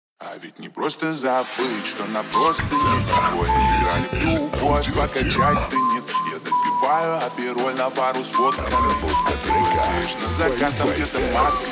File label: 31-35 Hz Low Bass